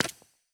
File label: reel Bounce; netent